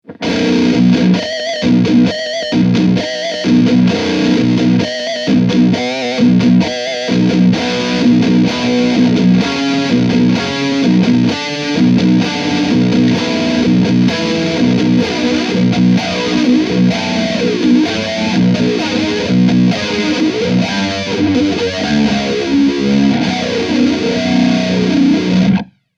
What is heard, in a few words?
stack baritone; cube-80gx; r-fier